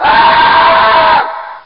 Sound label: Voices Cultists - SCREAM